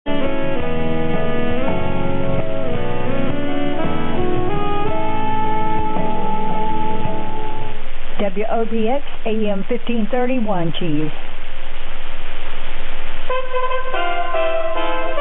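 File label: chimes; 1530 WOBX Wanchese NC 2200 UTC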